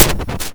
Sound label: Shotgun Snare, drum machine; 300 Drum Machines; DevSnd